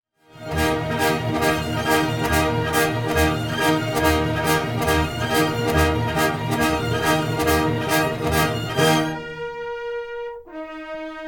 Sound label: Orchestral, Anechoic; OPENAIR